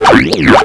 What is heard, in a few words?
WEIRDO